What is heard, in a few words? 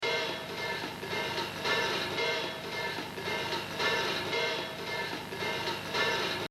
Exercise, jazz drumming